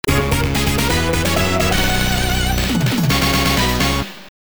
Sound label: Scene Clear Jingle; Teenage Mutant Ninja Turtles